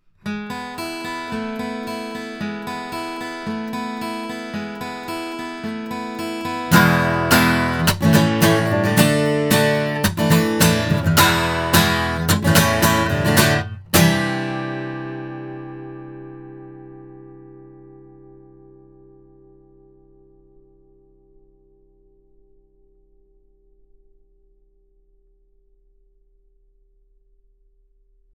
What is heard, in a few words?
guitar; BLUE Babybottle